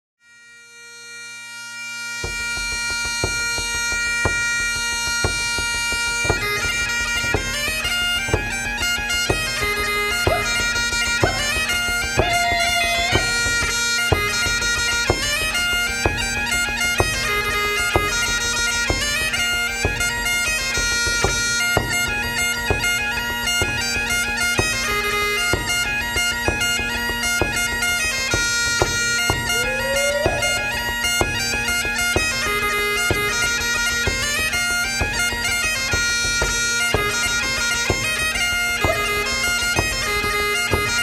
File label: Bagpipes Scottish Jig; Fole